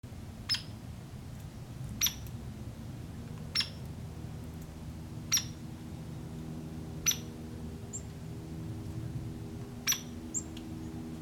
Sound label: Hairy Woodpecker, Leuconotopicus villosus; woodpeckers